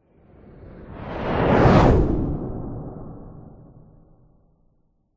science fiction spacecraft super sonic pass by zoom whizz